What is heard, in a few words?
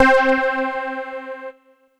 CZ Bladkeys; Ableton; Retro Synths; Phazeform Vol 1